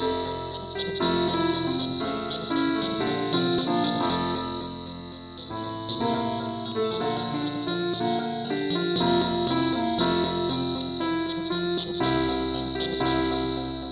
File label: keyboard only key f